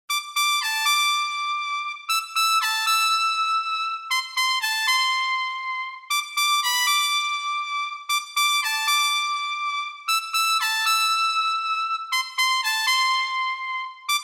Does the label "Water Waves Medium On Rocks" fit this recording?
No